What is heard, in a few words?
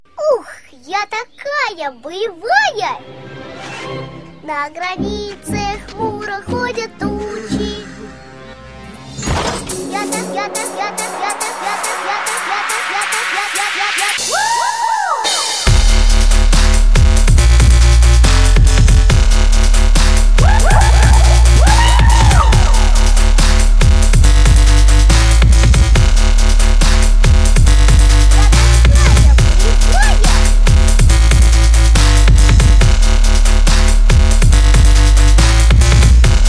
dubstep; masha-i-medved-dubstep